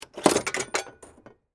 Arcade Game Push, Technology, Arcade, Game, Push, Quarters, Into, Slide, Mechanism; BlastWave